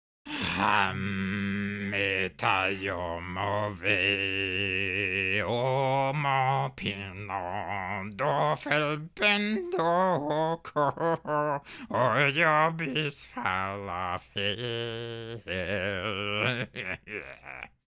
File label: wounded sing; Kratt; Hollow Knight: Silksong